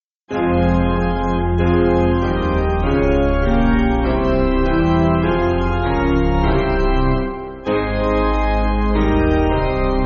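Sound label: Organ Piano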